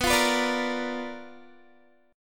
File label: B Minor Major 7th Flat 5th Guitar; BmM7b5 strummed